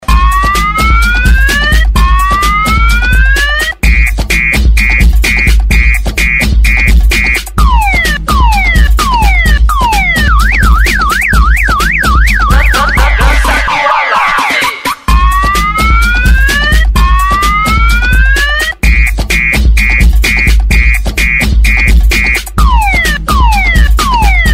danca do alarme

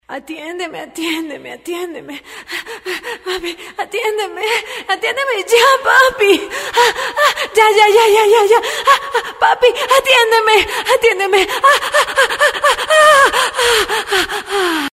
orgasmo adulto

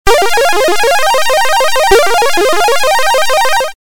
level completado

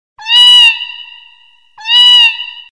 gatinho miando - sons de animais

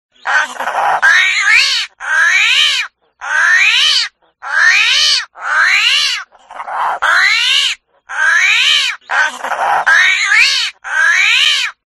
tatu sons de animais

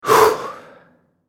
alivio

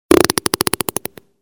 peido curto ringtone

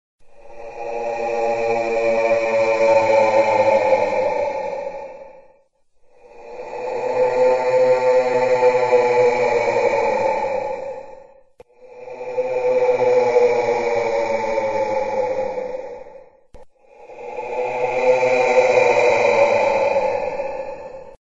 som de terror do alem

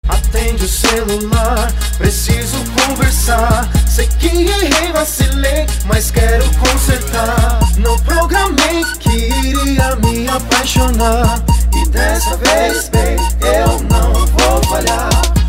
musica atende o celular preciso conversar

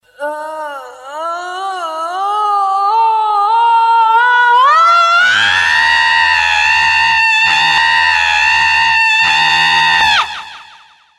alarme de grito, alarme grito alto

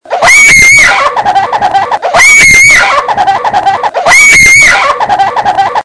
grito assustador

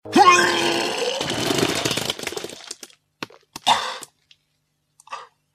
mensagem de vomito sms

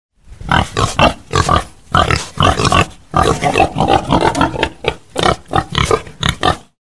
porcos, sons de animais; som de porco

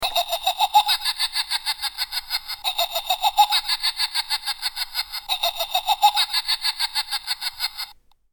bruxa rindo brinquedo

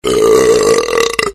Super Arroto

